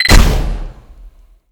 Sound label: flechette det; tf2classic